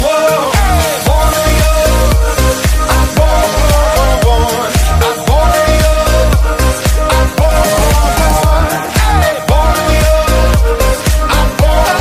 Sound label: hot electro ringtone